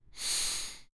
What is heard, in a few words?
medical